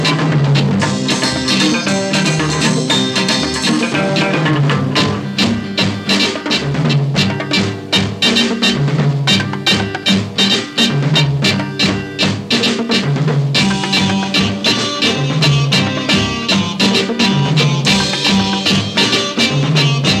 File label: Oriental, folk instrumental